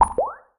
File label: hover; footstar